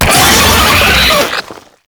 Proto Infested Brachiolyst Pain, Vocals, Enemies; Warframe

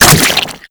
Infested Moa Slow Bomb Fire

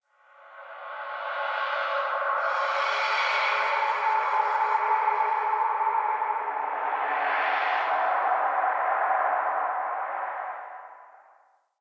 Crypt Cymbal Swell; Ambience; Champion Crypt

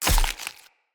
Claw Small Impact Flesh; Weapon Impacts; Enemy; Unarmed

